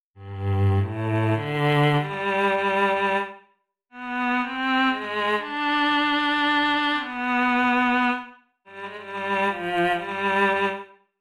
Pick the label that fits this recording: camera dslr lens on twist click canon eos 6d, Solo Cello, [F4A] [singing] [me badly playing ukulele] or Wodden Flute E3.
Solo Cello